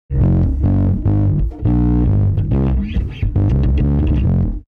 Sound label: super bass dirt